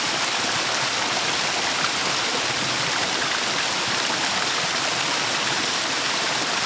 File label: Water Falls; GrandFantasia